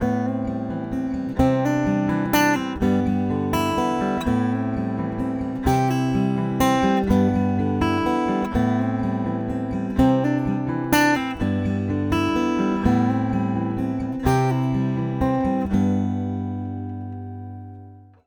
fame looper picking direktsignal

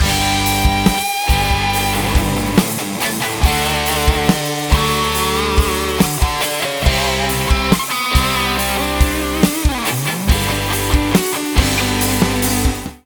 geschnittenes solo, gitarre

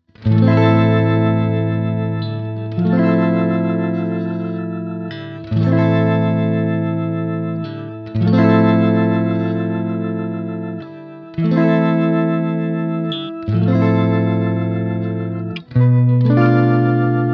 walrus audio luminary, Polyphoner Quad-Octave Generator

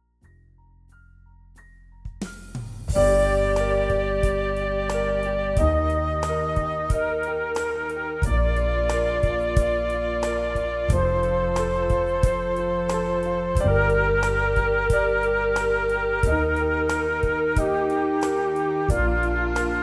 Akustik-Ballade; songwriting

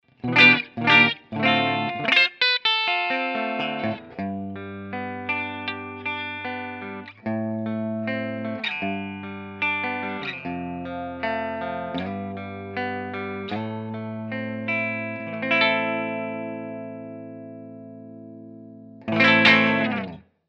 clean steg, gibson les paul traditional

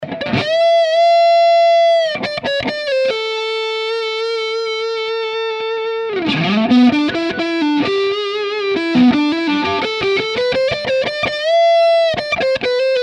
Gibson Les Paul Standard test lead solo hals

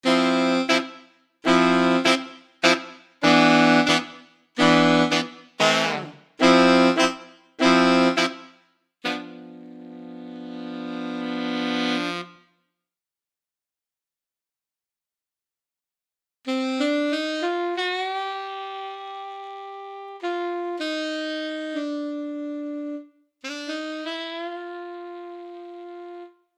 horns alt-sax; chris hein

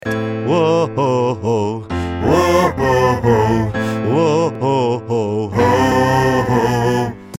insert oktave hi effekt ab; Verdopple die aktuelle Stimme

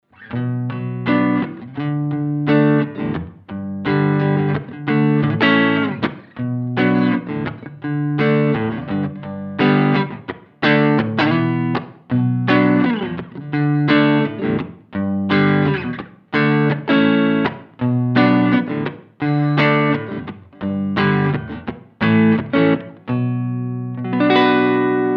two notes le crunch kanal a strat neck pu analoge speaker sim

